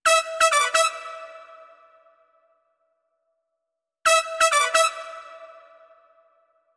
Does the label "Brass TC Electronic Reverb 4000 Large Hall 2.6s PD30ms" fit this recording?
Yes